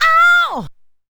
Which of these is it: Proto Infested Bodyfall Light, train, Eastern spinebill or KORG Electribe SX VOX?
KORG Electribe SX VOX